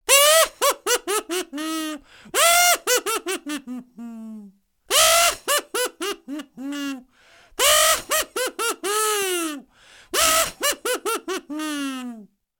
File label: Creepy laugh